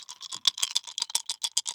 dice shake; squares

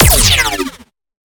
eye laser; battle

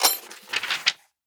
exchange start; coup

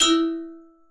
plus; rollingballs

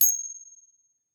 grab gem; pirates

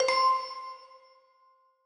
bunko

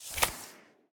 play card; squares

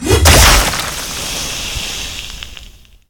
volcanic warhammer; battle

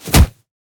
backhand; battle

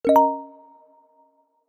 alert; motd

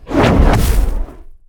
flaming sphere; battle

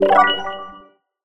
find game loading

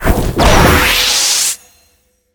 super drain; battle

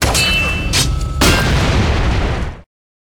suicide dive; battle

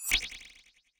appear; battle

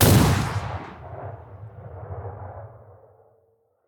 cannon; pirates

